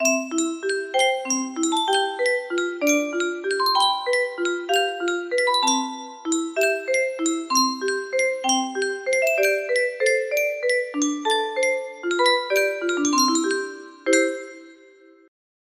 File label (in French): yunsheng boite a musique, music box melody